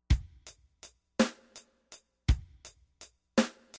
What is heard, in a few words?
kick and snare pattern; Programming Drums On The BR-1600CD